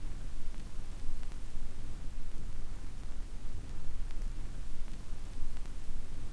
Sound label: Tape Noise; noise hiss crackle